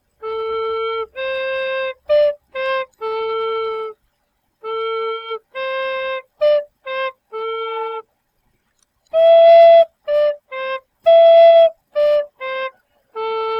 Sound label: temple bells